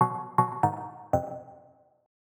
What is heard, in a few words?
120bpm Plucks